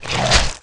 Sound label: spit, aliens mutant